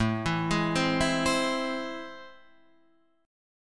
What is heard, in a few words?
A Minor Major 7th A down, AmM7 chord; arpeggiated; JGuitar